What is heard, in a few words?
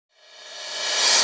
143 Garage Reverse Cymbal; Dance DJ